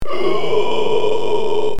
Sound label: some kind of being sound